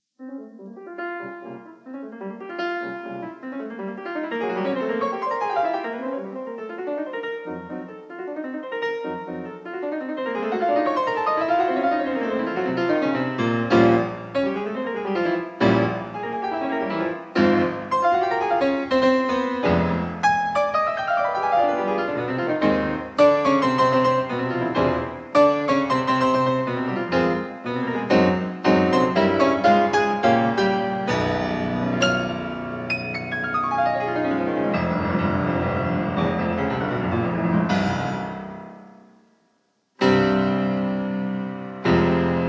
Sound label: Prelude in F minor; F. Chopin; 24 Preludes, Op 28